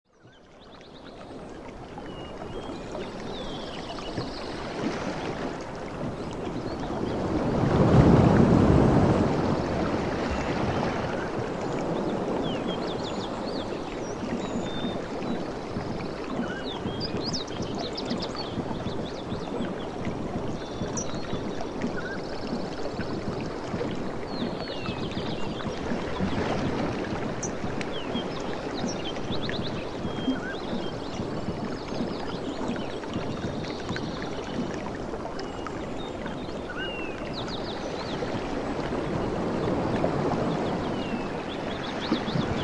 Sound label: ocean